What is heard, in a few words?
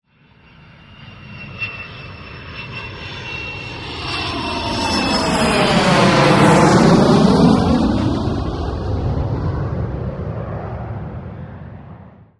nimrod, Aircraft sound